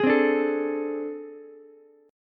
A Minor Major 7th Double Flat 5th, down, Ukulele; strummed; JGuitar

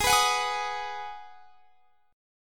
G sharp Minor 7th G sharp; G#m7 strummed; x,23,21,23,21,23

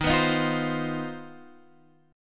C Minor Major 11th

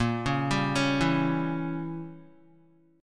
Bb Diminished 7th Bb down Guitar; arpeggiated; 4F,4A,5C,5G,5C,6E; chord shape

